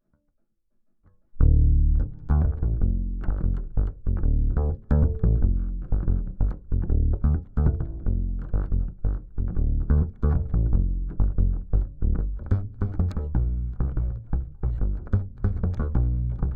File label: Bass DI